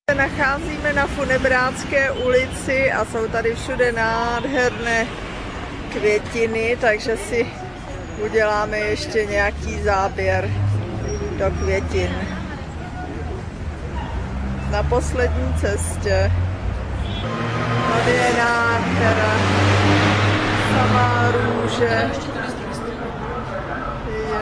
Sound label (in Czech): Quito funebracka ulice; Video Ekvador